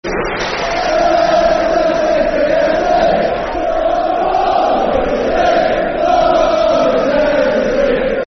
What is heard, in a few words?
Leicester City [Football Chant (Soccer Songs)]; Leicester City Fc; fanchants; Leicester City Lcfc